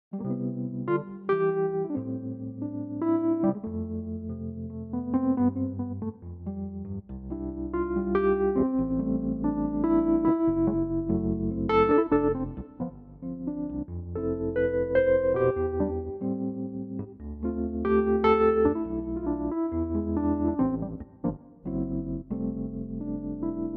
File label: Wurly; VIVO H10